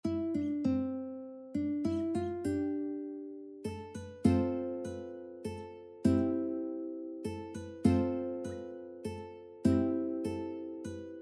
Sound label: The First Noel Ukulele